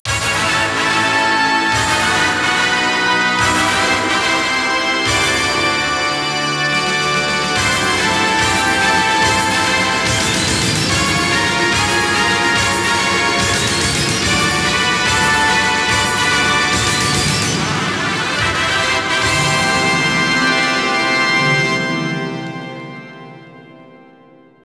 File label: Str G#